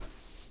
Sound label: foley grab; Earth and Beyond; EA Games